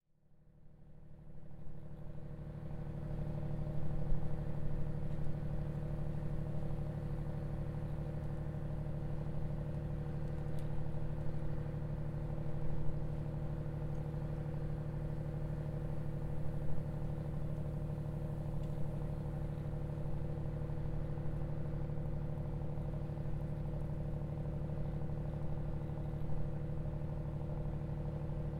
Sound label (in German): hafenbecken boot